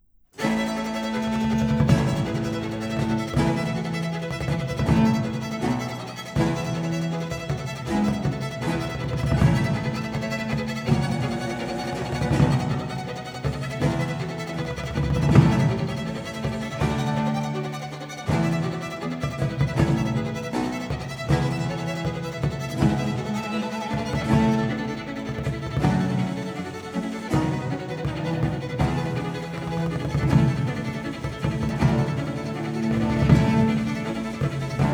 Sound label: Sonata for Violin and Continuo; Johann Paul von Westhoff; Deutsche Grammophon